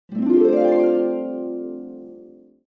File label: gift store sound